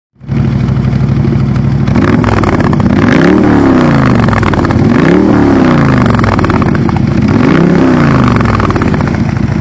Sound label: guzzi sound, motorrad; Kradbis 2016